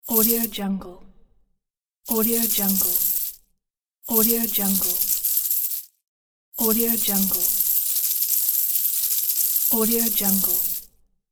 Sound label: coin; 81inc